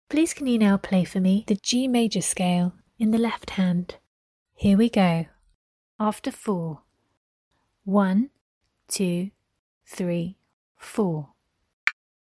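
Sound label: G major scale left hand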